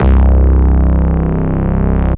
Casio VZ 10M Buzzy Deep Bass C1

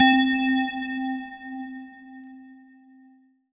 Kawai K5000W K Bells C4

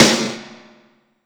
Snare Drum; Drums - Snare

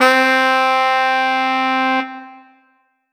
Roland GR-1 Saxophone C5

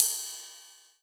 Yamaha TG100 Ride Cymbal

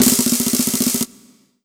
Snare Roll; Korg N1R

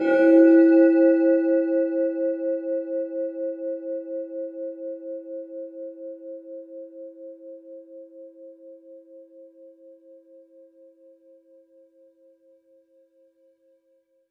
Yamaha V50 Shrine Bell C4

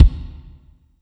Bass Drum

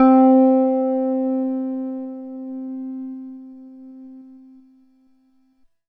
Ensoniq ESQ-1 FM Piano C4

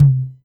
Korg DW 8000 Syn Hand Drum

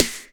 M-Audio Venom Snare Drum

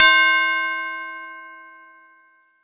Korg NS5R Tubular C5

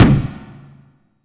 Slam

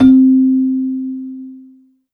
Casio CTK-611 Celesta C4

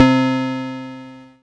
Yamaha PSS-170 Banjo C3

